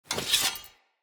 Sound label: UI Faction Equip Courage